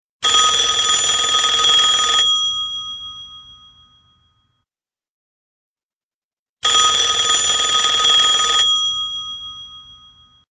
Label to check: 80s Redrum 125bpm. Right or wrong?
Wrong